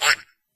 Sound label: moving; HeavenStudioPlus; sneaky Spirits